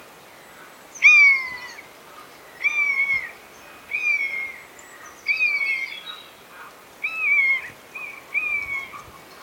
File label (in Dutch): buizerd roep